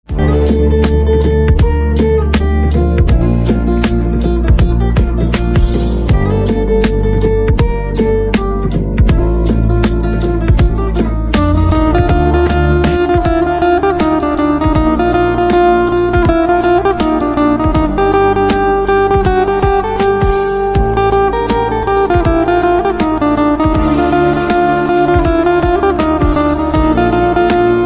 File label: Guitar